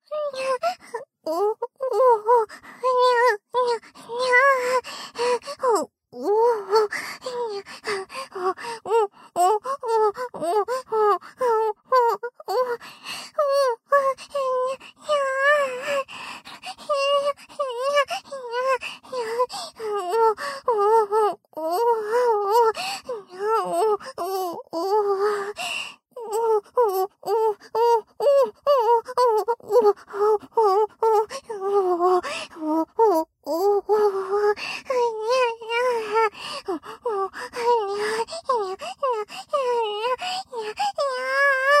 オホ喘ぎループ

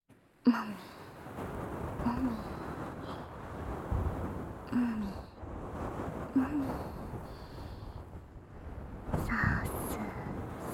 ASMR お耳マッサージ

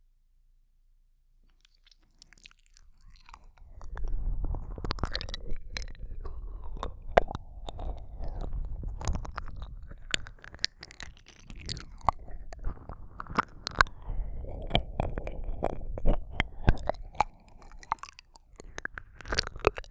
ゆっくり舌を動かす耳舐め, ASMR

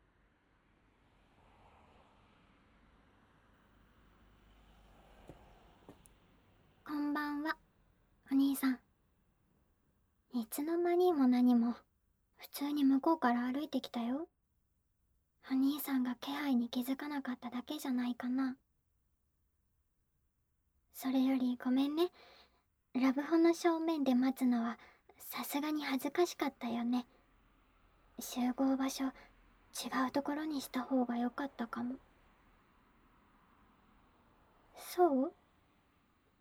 耳舐め手コキ SE小, ASMR; 効果音小さめ

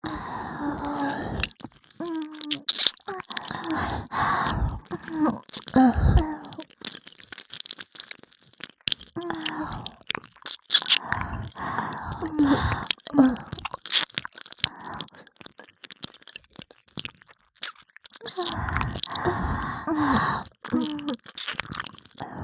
両耳舐めループ; 通常耳舐めver

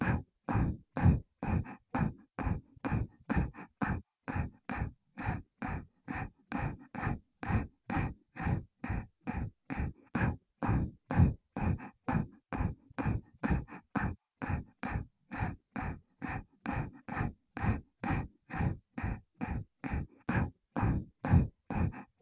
アッアッ 普通

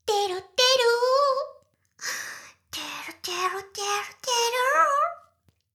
シコシコ音声; 魔法少女マスカリマッスル

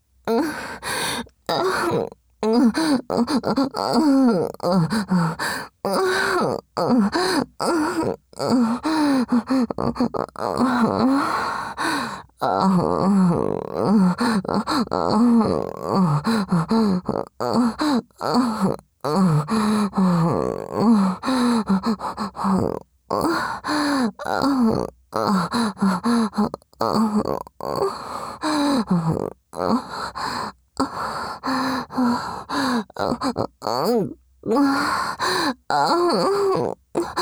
喘ぎ, ASMR; 手マンコとひょっとこバキュームフェラ; 右

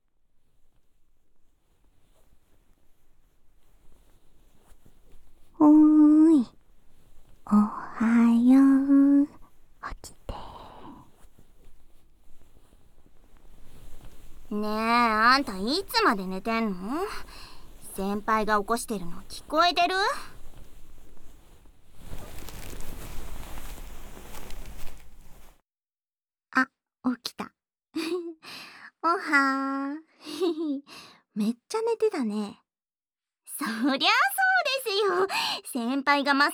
『耳かき Ｗ梵天 Ｗ耳吹き』（ASMRver)

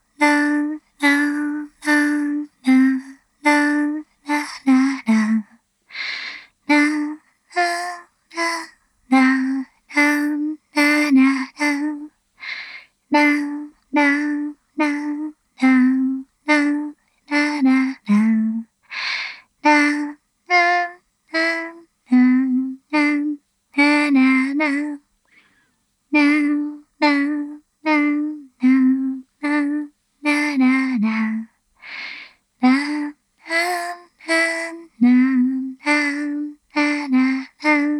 子守唄 リバーブなし; 楽曲

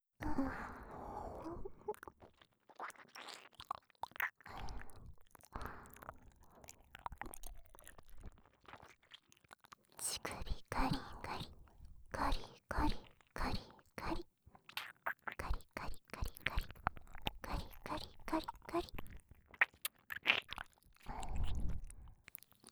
耳舐め囁きループ, ASMR

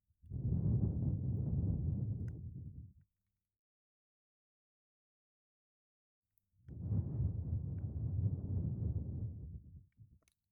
耳ふ～

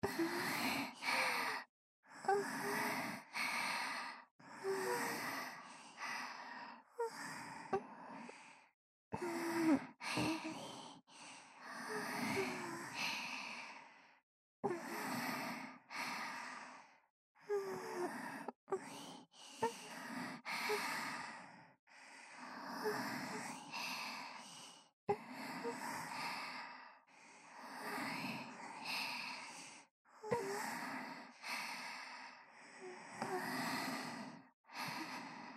吐息; 美月＆美星の発情吐息

